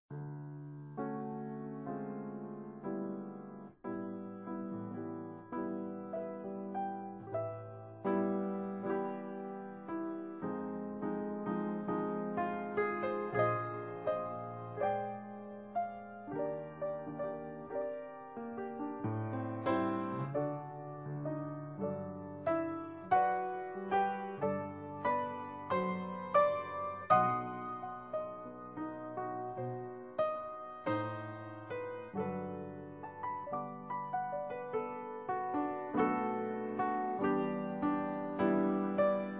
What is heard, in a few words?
Piano Bar